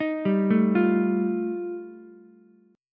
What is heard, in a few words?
G 7th Suspended 2nd Sharp 5th G chord, Ukulele; arpeggiated; JGuitar